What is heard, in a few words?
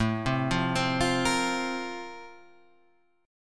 F Suspended 4th A; Fsus/A arpeggiated; JGuitar